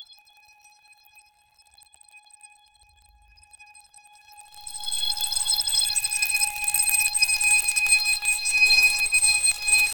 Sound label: continuous cinematic crys, texture